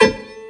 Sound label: robot movement; npc robot